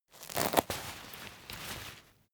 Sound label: umbrella telescopic open velcro